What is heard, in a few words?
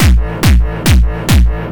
Reversed Bass